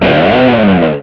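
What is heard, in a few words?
power guitar; Northstar - New Gold VOL-1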